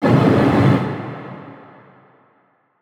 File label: brain Hit; Thalamus; CARRIER